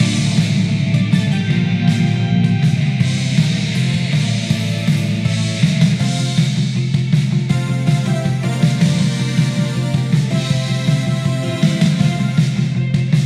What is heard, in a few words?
TV music mono loop; hot lava; ios macos windows